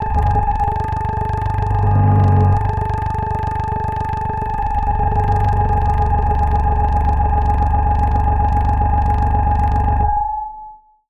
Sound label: Tractor; martyn stonehouse